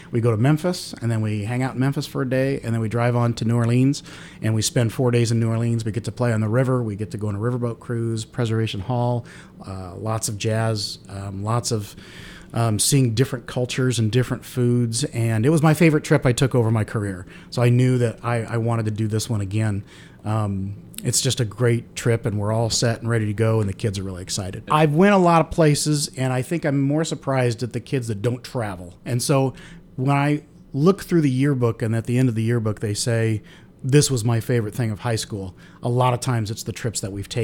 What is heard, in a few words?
JAZZ BAND; MERCO; high school jazz band traveling to memphis and new orleans